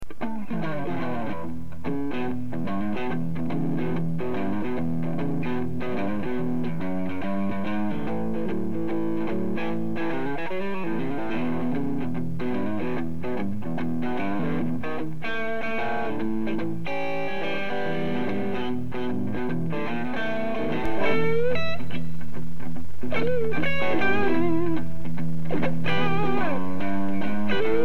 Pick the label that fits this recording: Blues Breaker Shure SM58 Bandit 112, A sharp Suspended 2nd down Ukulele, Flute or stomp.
Blues Breaker Shure SM58 Bandit 112